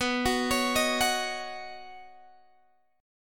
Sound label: B Major Add 9th B, Guitar, Open G